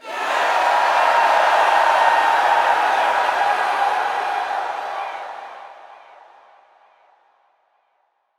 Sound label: Heavy Cheer, Crowd; Lunaro